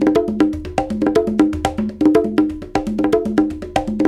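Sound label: Busy Drum Whistle, African Contemporary; Spectrasonics; Supreme Beats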